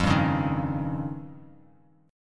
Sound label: F 7th Suspended 2nd Sharp 5th F Bass Standard; F7sus2#5 strummed; 13,10,11,8